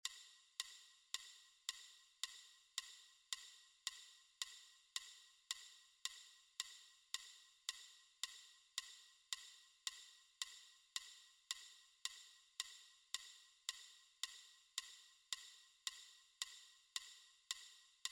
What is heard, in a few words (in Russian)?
метроном 110